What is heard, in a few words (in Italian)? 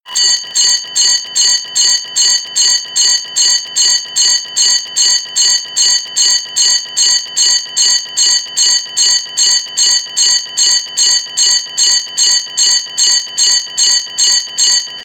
segnale di scossa in atto; vaccaria